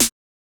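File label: HSE SD, house snare drum; X-Static Goldmine 1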